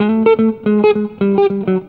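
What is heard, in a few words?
electric guitar melody; Best Service; World Colours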